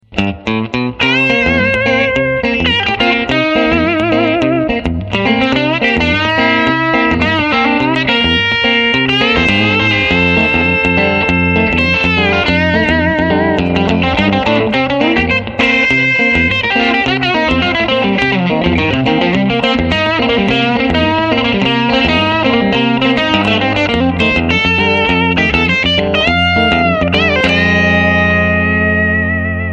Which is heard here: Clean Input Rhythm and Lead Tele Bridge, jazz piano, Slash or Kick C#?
Clean Input Rhythm and Lead Tele Bridge